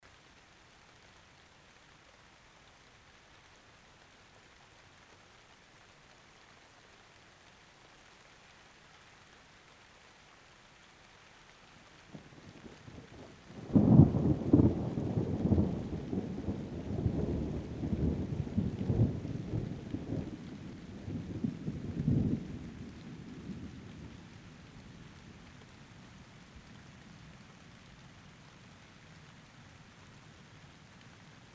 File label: Nice Rain Thunder